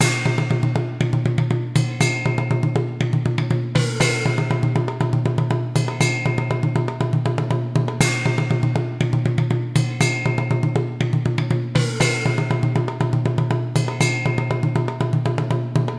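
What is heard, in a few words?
percussion; saolei; Identity V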